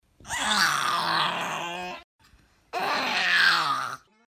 popytka cmeha

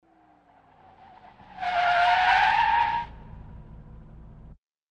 vizg tormoza shiny

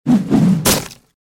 zvuk tamagavka vrashhenie i vonzanie

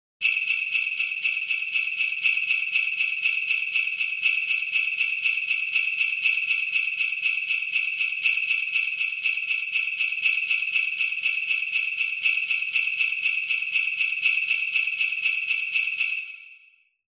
bubency ritm

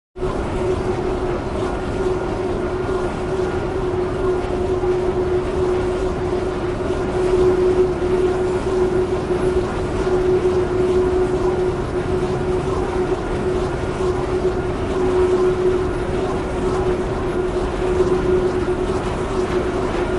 zvuk vetrogeneratora vetriaka

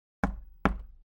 dva legkih nereshitelnyh stuka

